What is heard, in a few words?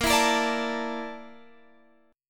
A sharp Major 7th Flat 5th, Guitar Open F; strummed; chordshape